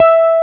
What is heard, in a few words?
E BASS E4, Bass Guitar, STACK BASS; AKAI S6000 CD-ROM - Volume 1